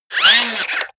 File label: robot movement very fast